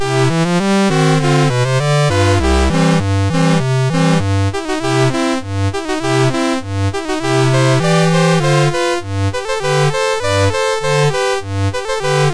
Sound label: Polka, MOS6581R2